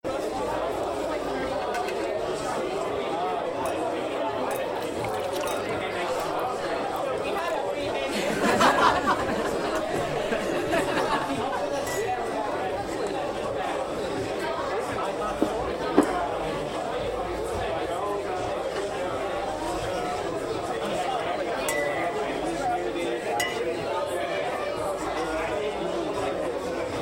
Amb Bar

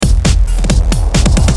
Intro Transition; Anki Drive; Android; Anki Drive Track 02

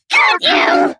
Hit

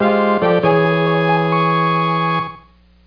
Rank Out (Jingle) (SNES); F-Zero 99

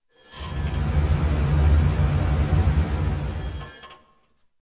Fan Sound

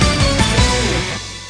Victory Final Arena; Miraculous Rise of the Sphinx

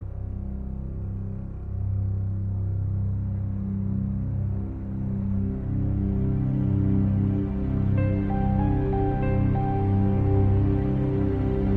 loop Ominous

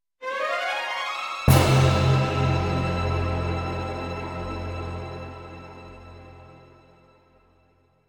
Music Suspense Short; nancy drew the phantom of venice windows gamerip 2008

